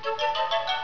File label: Steps; Buttons